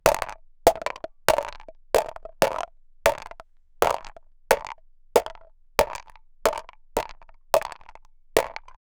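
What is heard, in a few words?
rubber balloons collide; event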